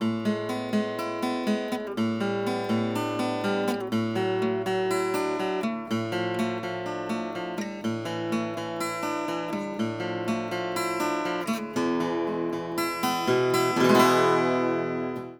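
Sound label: acoustic Guitar arpeggio off; Earthworks SR20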